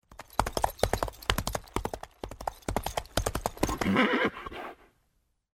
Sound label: Horse; Microsoft Studios; Age of Empires III - Complete Collection; cinematics